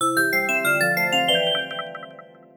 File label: (Jingle) Help